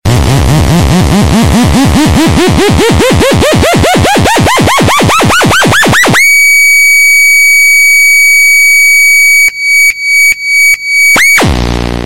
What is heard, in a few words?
zzzzzzzzz